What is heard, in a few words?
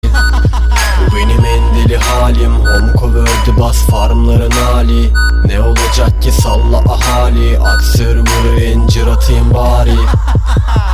bass